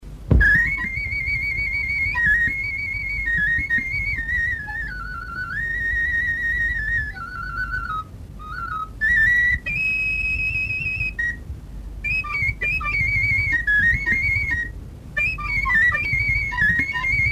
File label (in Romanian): Doina Miorita la fluier; Fonoteca Arhivei de Folclor Vasile Adascalitei - CJCPCT Vaslui; cantece de dor si jale